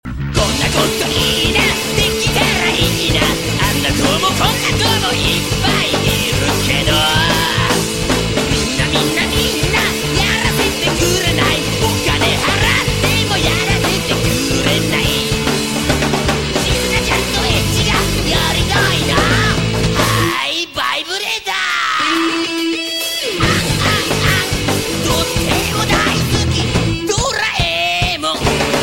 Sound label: heavy metal; Doraemon